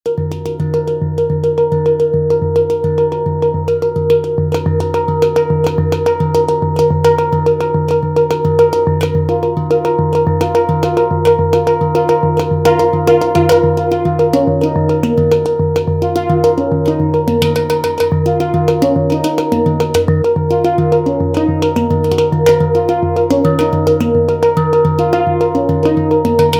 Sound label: Pan Drums, Hang2 in D; Soniccouture